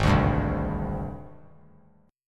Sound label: Ab Major 7th Flat 5th Ab, down; AbM7b5 strummed; JGuitar